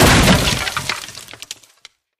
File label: stampede break; jumanji